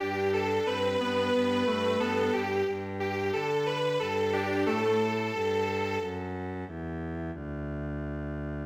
Strings Quartet; Charumera

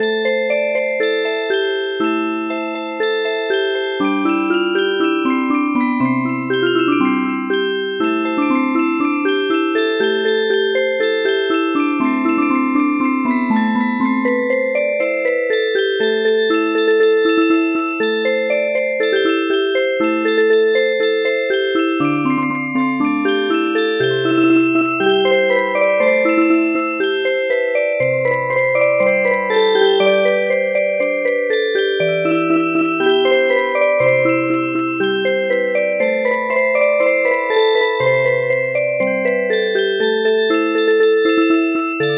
Music Box; Arohnee

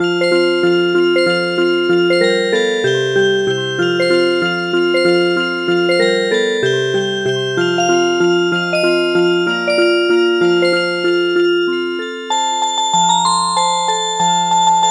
Music Box

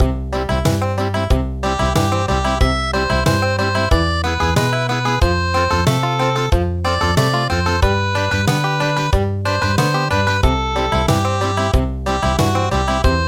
Country

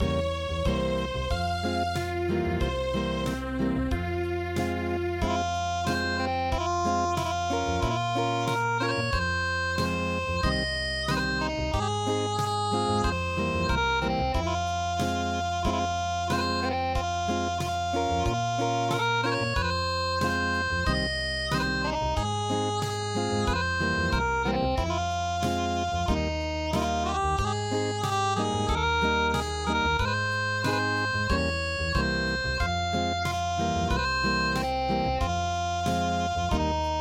Acoustic, traditional; Swedish Cradle Song

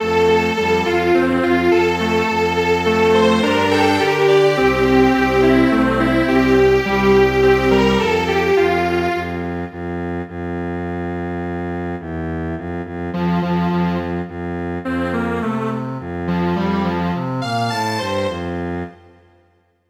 Strings Quartet

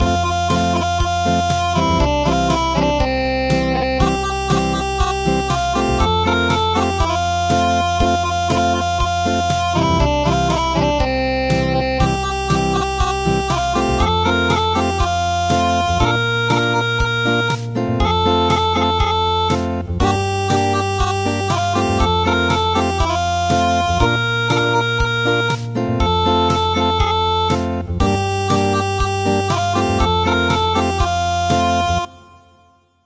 Acoustic; traditional

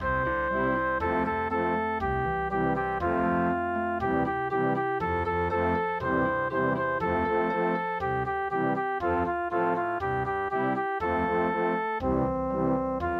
Vienna Waltz

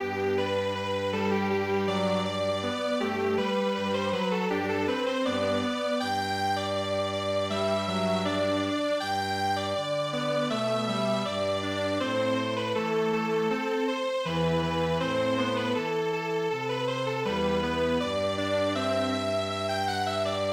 Strings Quartet

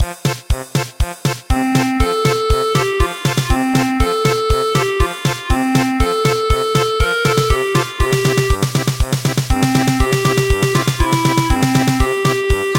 German Polka

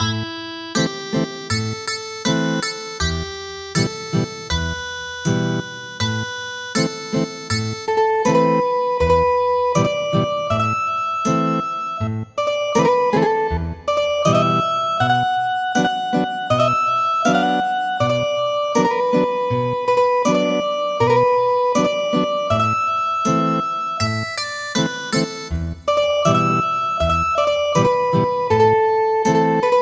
Ethnic Guitar; traditional

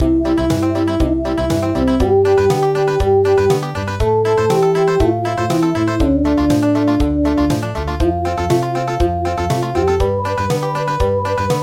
Country

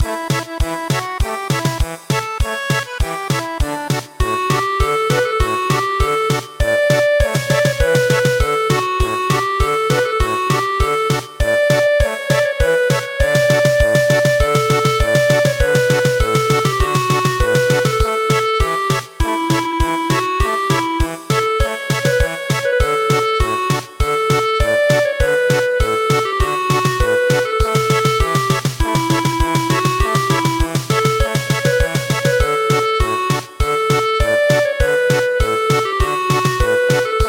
German Polka; The beautiful Gigogin

